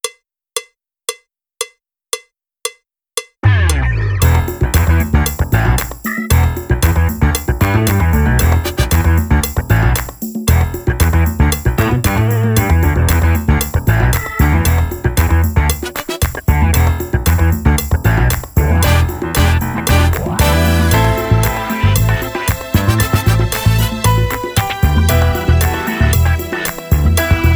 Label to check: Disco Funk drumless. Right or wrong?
Right